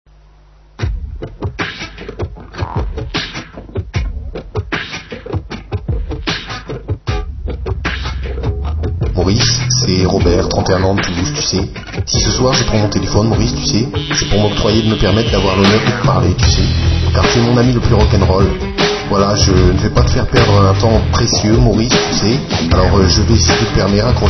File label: jingle